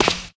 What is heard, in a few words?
footstep grass